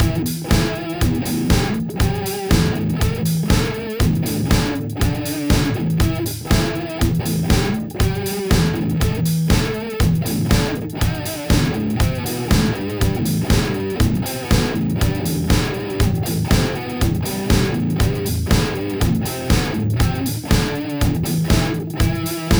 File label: neck ultra distorded, gibson sg; sg goddess